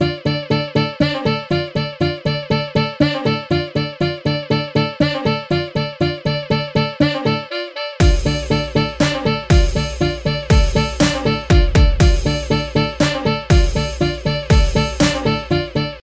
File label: Fun Saxophone